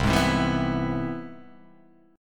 C Augmented Major 7th

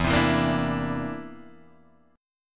D 7th Suspended 2nd Sharp 5th E

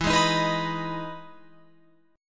E Major 7th E down Guitar Open F; EM7 strummed

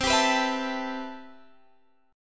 C Major 13th C, Guitar Drop D

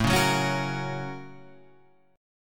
A sharp Minor Major 7th Sharp 5th

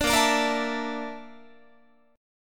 Bb Major 7th Flat 5th Bb Guitar; strummed

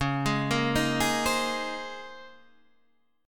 A sharp Minor 11th

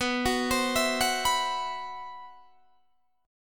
C Major 7th Flat 5th B

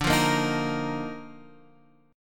D sharp Major 7th Suspended 2nd D

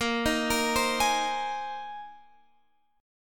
A sharp 9th Suspended 4th, chord

